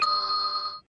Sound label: Test Tone; Pacert